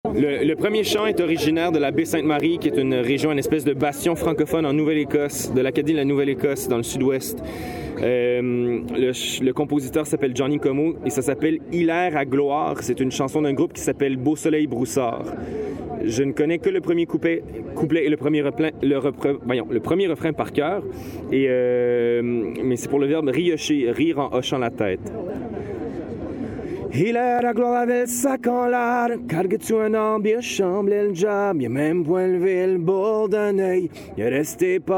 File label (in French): chant